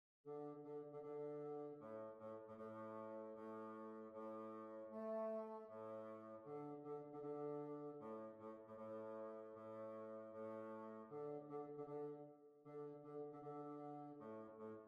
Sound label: Hornpipe